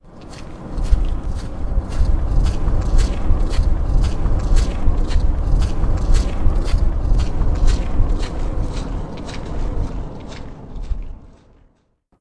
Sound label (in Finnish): askeleet